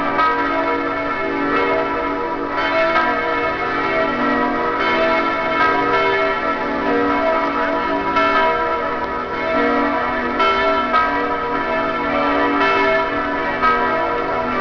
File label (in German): Glocke